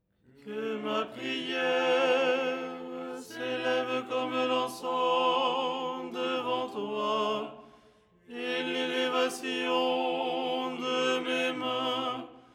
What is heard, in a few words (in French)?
tenor